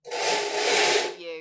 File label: Slide Curtain; Household Sounds / Doors, Windows & Locks